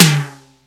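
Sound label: electronic tom, electronic drums; Crypton; DrumBase5000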